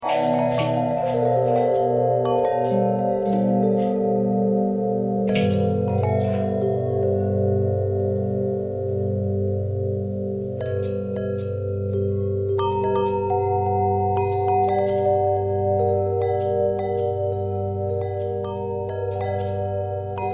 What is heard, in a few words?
longbell bendtwist